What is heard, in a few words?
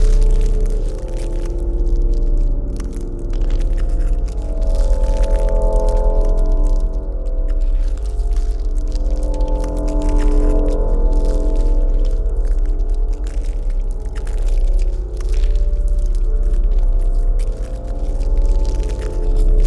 pro ship science station idle; biogenesis